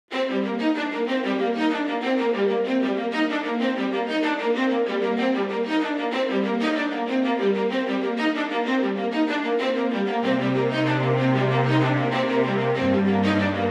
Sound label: stock music